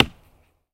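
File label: knock with a stick on wood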